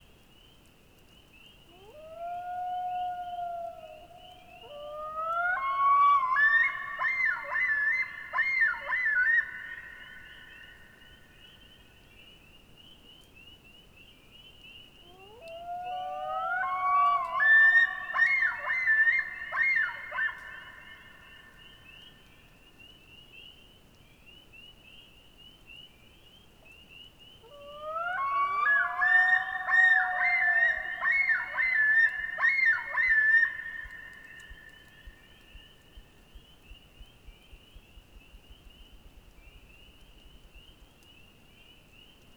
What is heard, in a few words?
Boundary Waters Loons